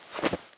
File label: move